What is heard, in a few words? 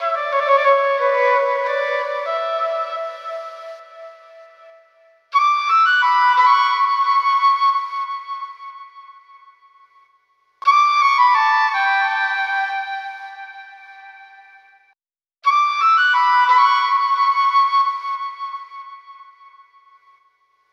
flute, Loops